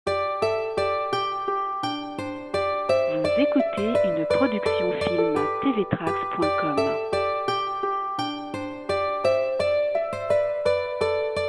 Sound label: Bransle de Poictou; Filmtv-tracks